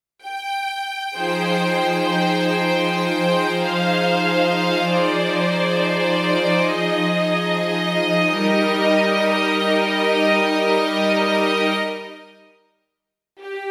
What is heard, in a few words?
Nord Piano 4 Strings Legato